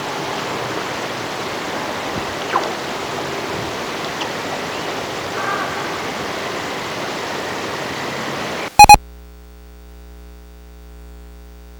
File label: moan